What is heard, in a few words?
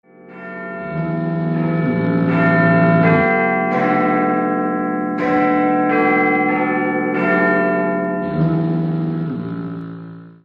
big ben fog horn